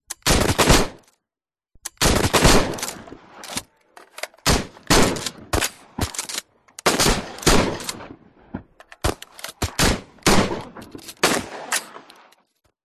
guns shooting reload; Audio Mangler

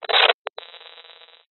science fiction radio signal interference burst

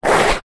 footsteps skid street rubber sole shoes; foley

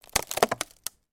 impact thin wood snapping

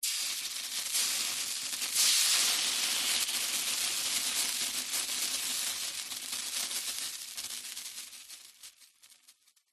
nature water hit hot metal sizzle bubble

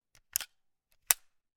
gun carl gustav m45 swedish k; still north media

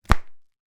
household book hard back close; zapsplat

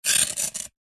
nature ice block sharp knife scrape

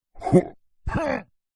fantasy giant male vocalisations fighting

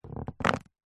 luggage briefcase push down pressure apply creak

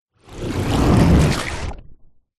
fantasy magic spell dark wet watery whoosh

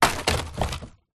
wood pieces thin plywood set down on ground

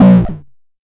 sound design short synth hit umm

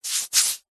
human bare feet skin rub together

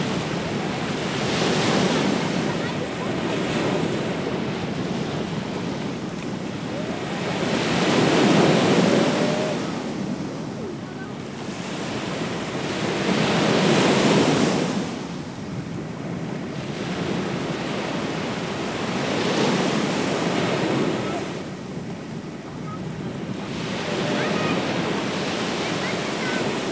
Sea, Waves, Winds; lilesound

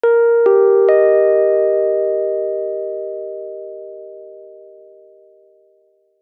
public places announcement tone bell dry

